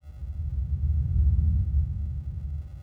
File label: deep rumble; ambience; Soldier Of Fortune II Double Helix; XBOX; kamchatka